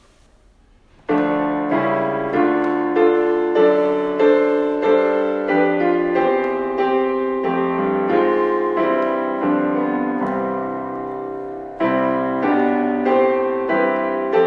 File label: backing track